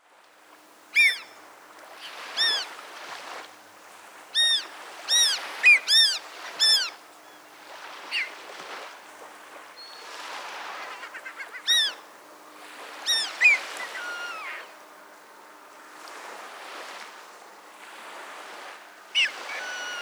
Bonapartes Gull, Chroicocephalus philadelphia; gulls terns

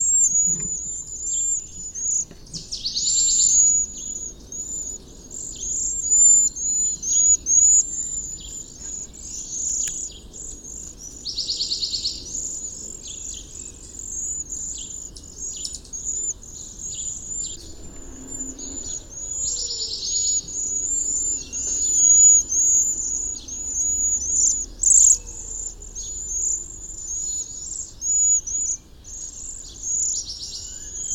Cedar Waxwing, Bombycilla cedrorum

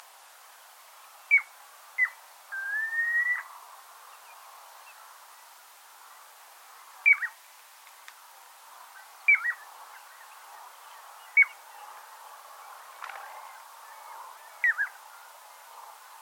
Piping Plover, Charadrius melodus; shorebirds rails

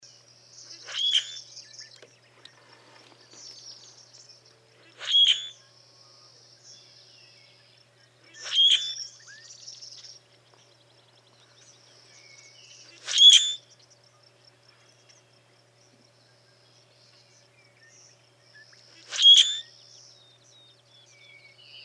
Common Grackle, Quiscalus quiscula versicolor; blackbirds orioles

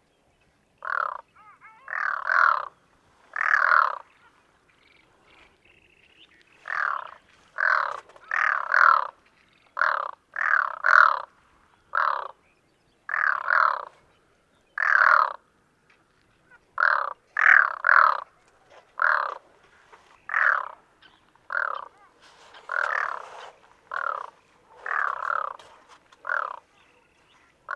Pacific Loon Gavia pacifica; waterfowl

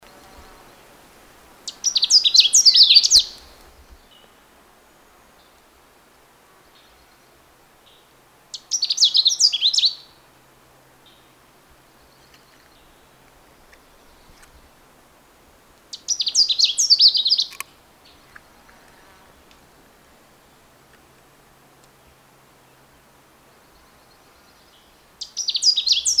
Canada Warbler, Cardellina canadensis; warblers

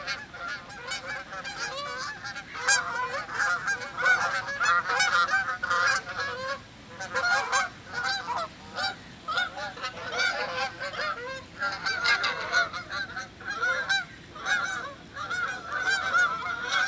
Canada Goose Branta canadensis; waterfowl

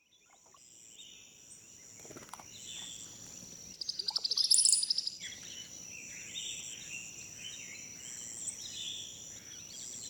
Brown-headed Cowbird, Molothrus ater ater; blackbirds orioles

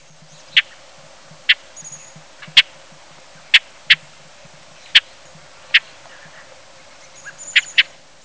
sage thrasher song

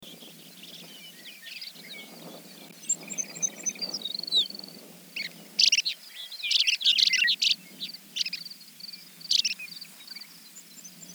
Chestnut-collared Longspur Calcarius ornatus; sparrows

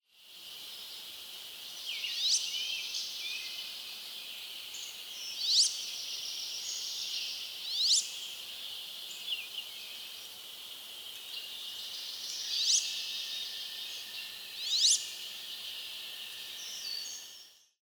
Wood Duck Aix sponsa; waterfowl

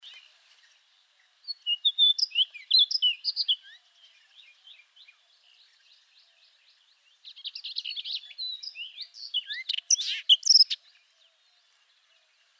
Orchard Oriole, Icterus spurius; blackbirds orioles

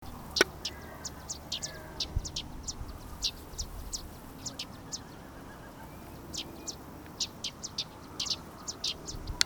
Yellow-rumped Warbler Setophaga coronata

